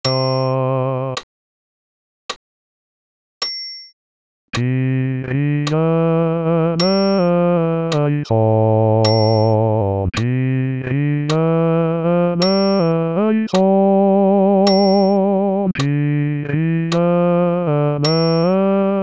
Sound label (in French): Kyrie bas voc seul